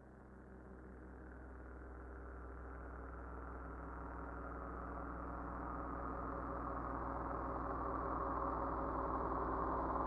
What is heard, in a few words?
Trill